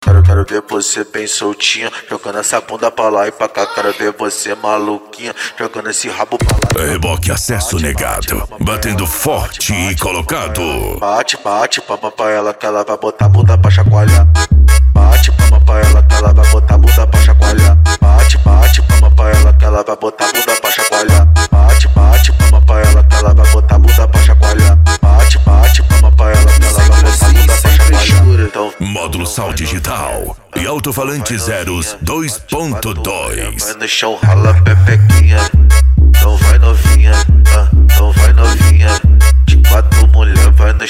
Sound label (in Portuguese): racha eletronica e funk mega bass